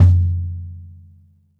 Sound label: bop kick - snares on; JazzFunkKit